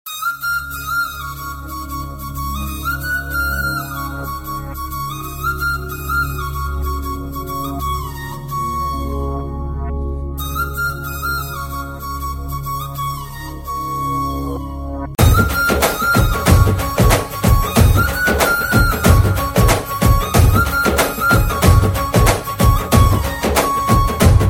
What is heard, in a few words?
Folk Flute